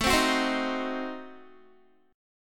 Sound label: A Diminished 7th A down Guitar; strummed; tuning=4E,4A,5D,5G,5C,6F